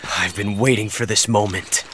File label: battle cry; ocelot